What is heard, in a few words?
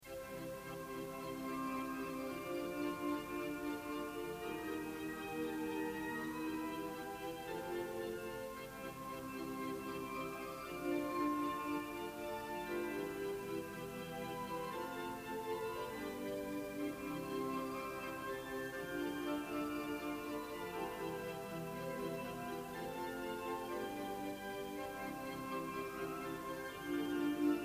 organ